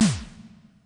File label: space snr, Drums, Kurzweil K2000